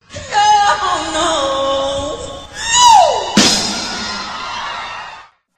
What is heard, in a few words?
jazz